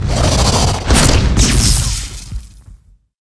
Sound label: giant scorpion attack, monster